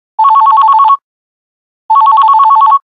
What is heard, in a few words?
9210 Desk phone lo, Nokia Buzzer; Windows Phone apps